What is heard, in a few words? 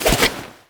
Infested Fishing Spear Charge; Warframe